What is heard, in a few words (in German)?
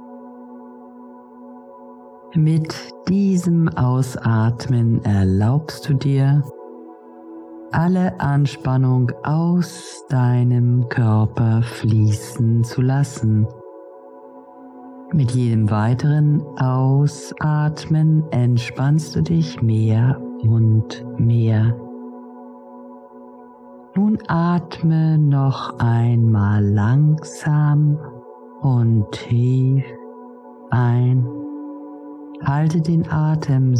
Freeze Musik; heilung von betrug und verrat